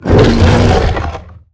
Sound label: Pain Light; Evolve; Behemoth